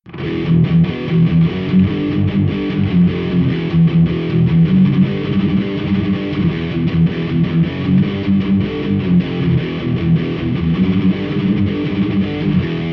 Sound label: shredmaster rhythm